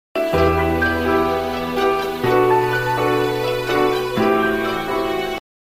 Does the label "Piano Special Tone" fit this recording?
Yes